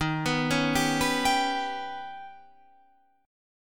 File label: D sharp Augmented 7th D sharp